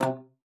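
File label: C3, bass flute; Xsample VOL-2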